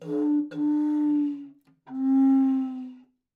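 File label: gliss on contrabass flute recorder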